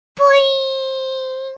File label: boing; svencoop; FZSaySound